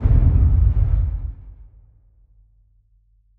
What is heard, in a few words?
train impact distant